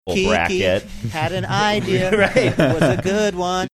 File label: singing had an idea